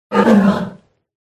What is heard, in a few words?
attack voice; zarlog priziv